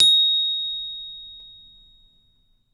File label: BEL ORCH